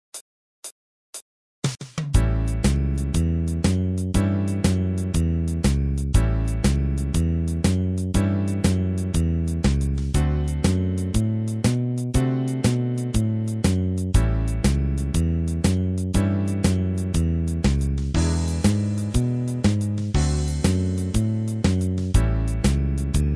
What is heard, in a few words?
harp